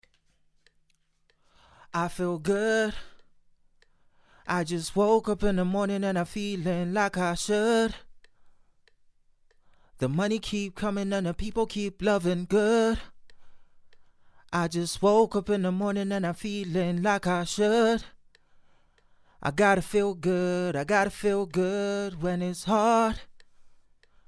pop 95 Ab, Acapellas

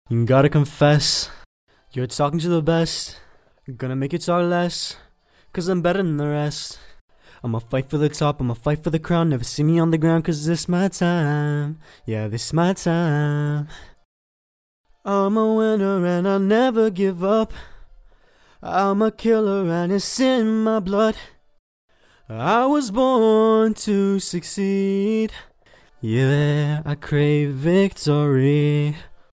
Victory Hook, rnb, 130, Dm, acapella

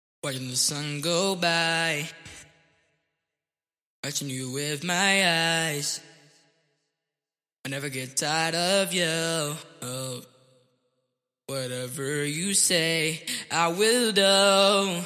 edm dance 128 AM

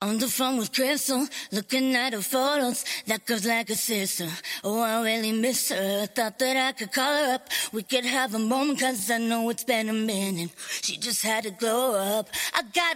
78BPM Key F, Acapellas

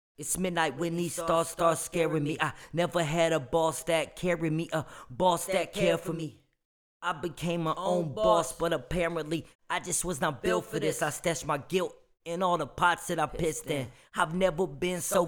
HH 77 FM

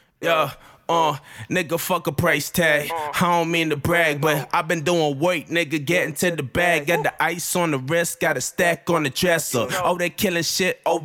HH 180 Em

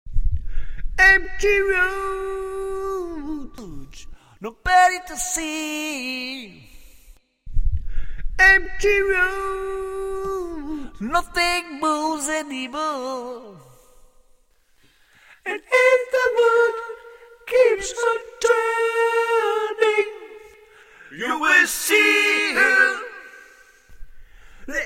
rock, 120, C, acapella

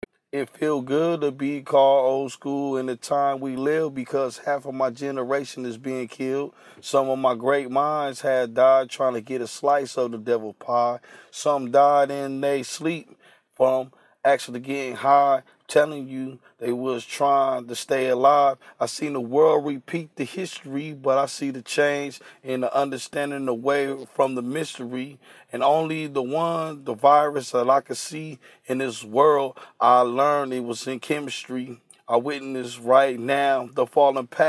hip hop 111 Fm, Acapellas

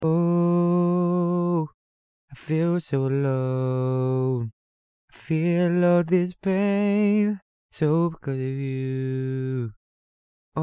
rnb 120 E, Acapellas; LostCause

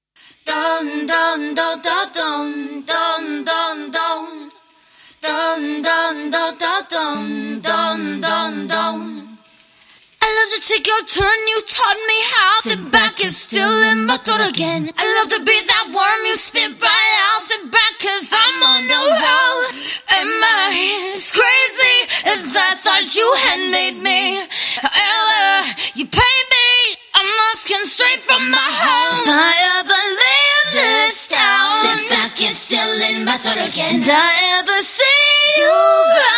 150BPM Key G, Acapellas

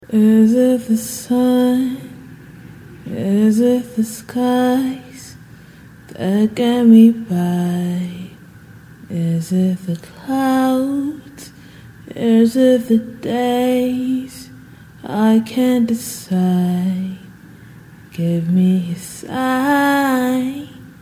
pop, 80, Bm, acapellas; Is It The Sun

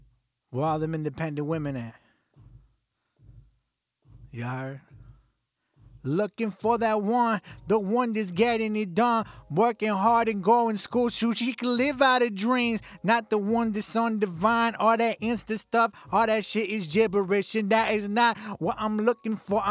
142BPM Key B

